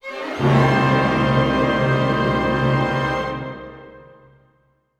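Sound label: orchestra; Masterbits - Switch FX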